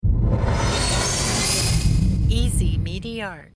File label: futuristic transporter passing by